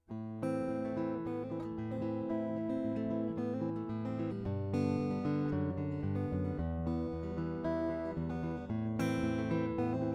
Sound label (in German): shadow sh 145 mikrofon-abnahme